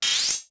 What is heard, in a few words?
World Map Zoom In